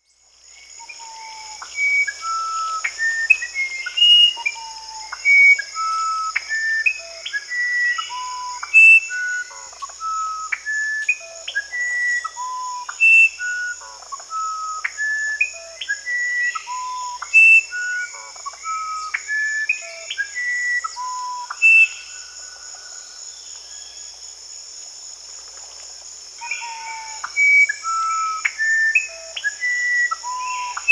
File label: Musician Wren (Cyphorhinus aradus), Peru; S. America